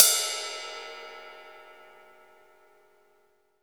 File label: MIX RIDE, MONO RIDE CYMBAL; AKAI S6000 CD-ROM - Volume 3